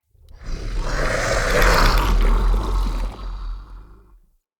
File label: Idle, Vocals; Characters; Enemy; Corrupted Sproutfolk